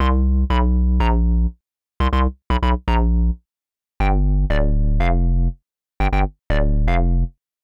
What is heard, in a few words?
Synth bass C, 120; PowerFX - Nu Jazz House